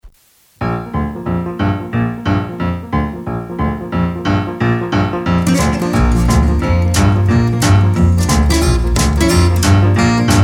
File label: Blues Boogie